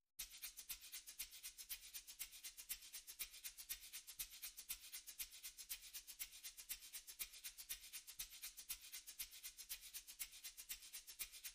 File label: Percussion and Bass Mix